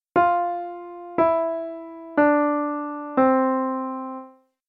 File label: Treble C Maj tetrachord down